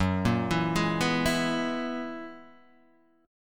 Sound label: Gb 11th, Guitar, Open D